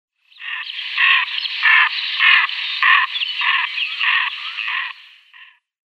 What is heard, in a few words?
American Wigeon female flight call